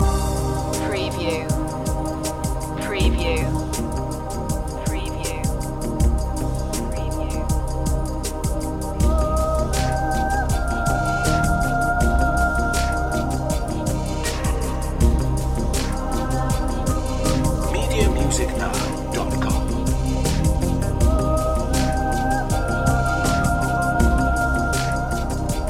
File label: lifeline loop